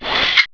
spider walk; hurrican

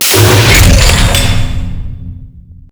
sub clamp open; mohaa; mechanics

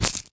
coin pickup; items; xash3d fwgs; induction

